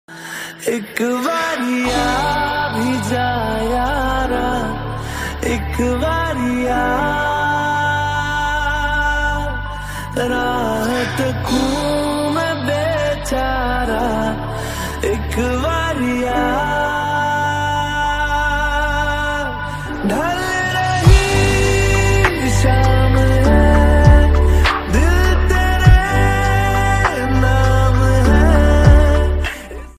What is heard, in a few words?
ringtone lofi mix ringtone